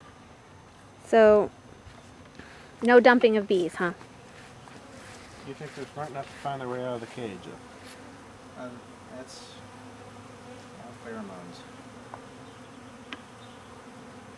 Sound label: close hive, bees